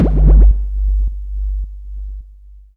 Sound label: Strange Kicks, Percussion; Dance Synths